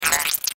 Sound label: Beep Digital Electrical Short